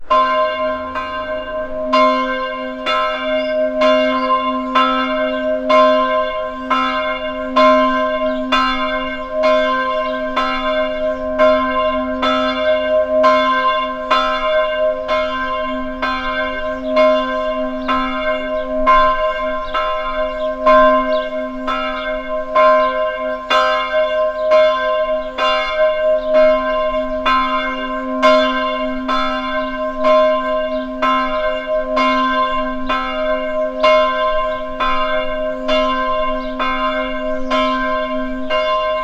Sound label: Church Bells And Birds